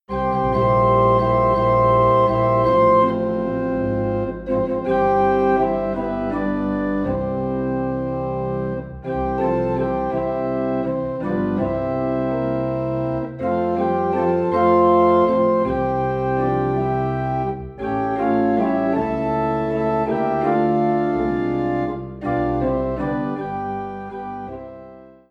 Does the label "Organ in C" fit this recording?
Yes